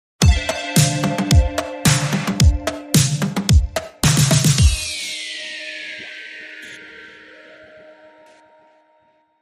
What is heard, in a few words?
Flanger Ride